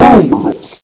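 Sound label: hurt, zombie; boomer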